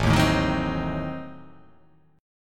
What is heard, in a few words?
A Diminished 7th A down